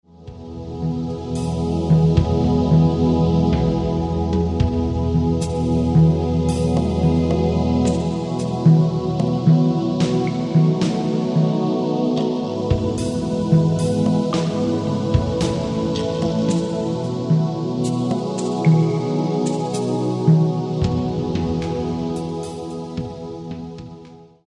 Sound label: Etherical Drums